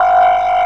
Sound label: no ammo; allegiance